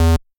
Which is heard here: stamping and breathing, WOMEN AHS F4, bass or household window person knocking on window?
bass